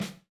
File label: Ghost; Reference beat bpm95; urban grooves; Rock